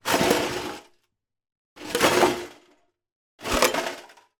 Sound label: foley glass broken bits in metal tin roll movements